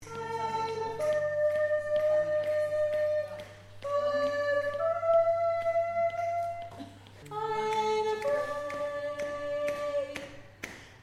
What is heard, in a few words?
tenor